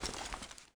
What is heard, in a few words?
foley walk